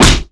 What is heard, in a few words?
Punch